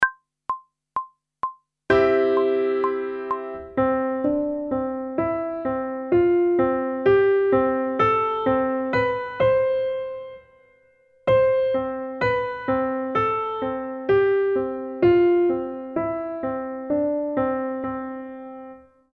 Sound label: Intervals of a Major Scale one octave ascending and descending, pop vocals; grade 6; Technical Work